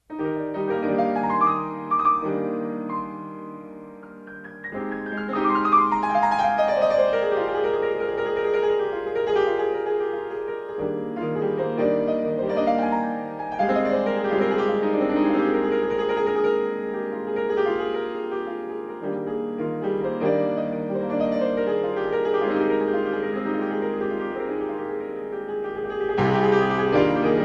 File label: solo